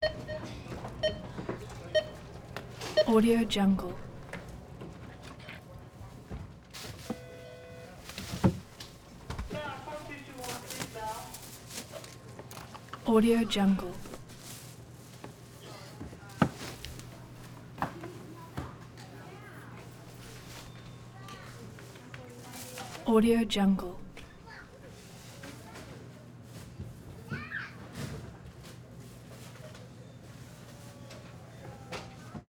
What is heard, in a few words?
supermarket register grocery store morning